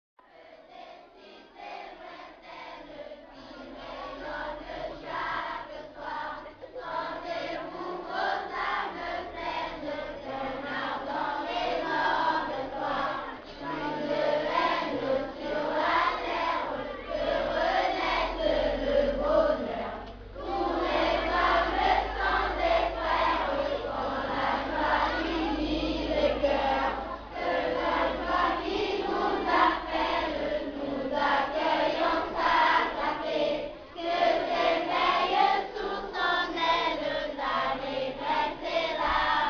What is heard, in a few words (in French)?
noel chant